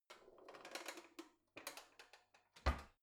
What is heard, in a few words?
old drawer creaky slide softly in